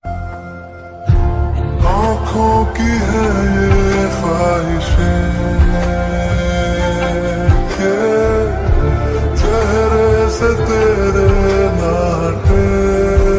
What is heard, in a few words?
Hindi Ringtone